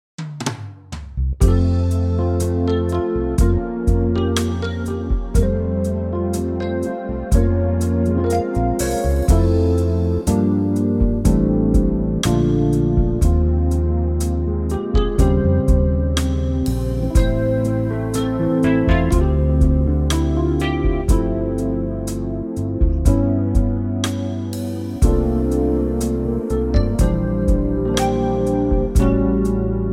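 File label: smooth F, Backing Tracks for Male Vocals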